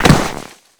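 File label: sandbag snow; Call of Duty Modern Warfare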